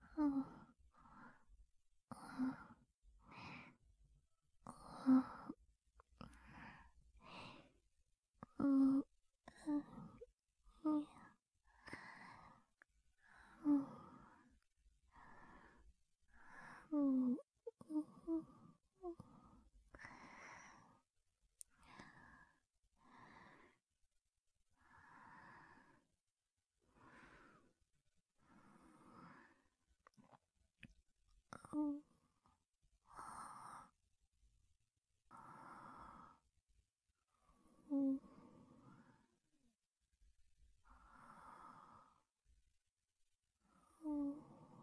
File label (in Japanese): 寝息ループ